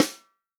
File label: snare drum, POP SNARE CHROMATIC